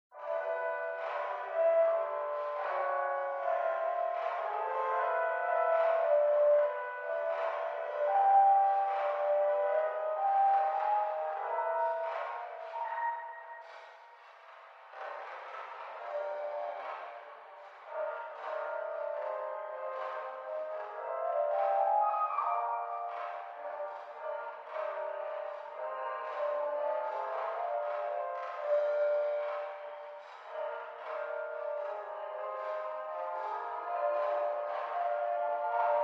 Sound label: Music Radio Reverberated; dead End Bar; dead End V02